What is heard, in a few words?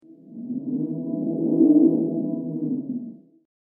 Haunted Wind Whoosh; Ghost Sound Effects; horror; Andrew Fleming